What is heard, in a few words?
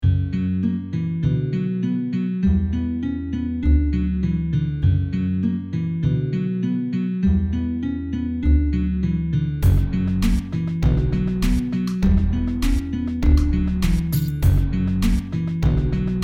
dark guitar